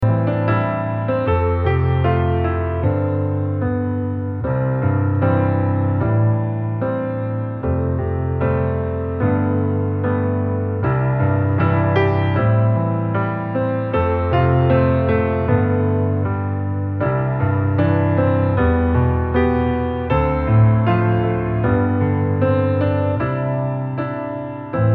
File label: piano solo